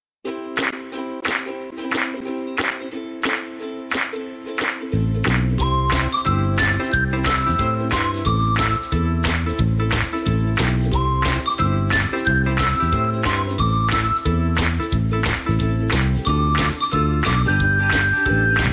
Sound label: jingle